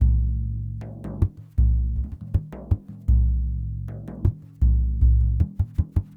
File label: Lg Frame, World Percussion